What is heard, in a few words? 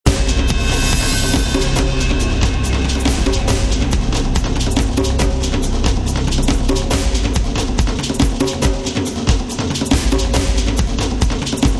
drums only